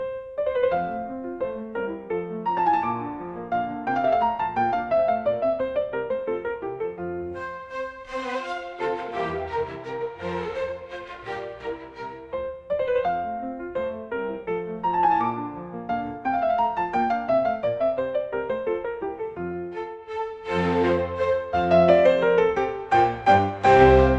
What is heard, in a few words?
Concerto piano G major